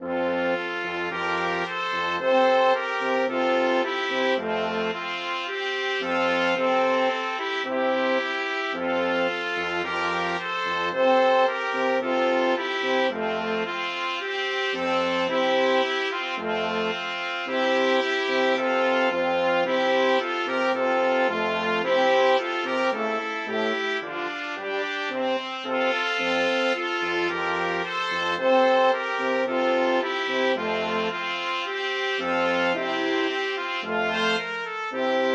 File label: ode to joy BRASS, brass quartet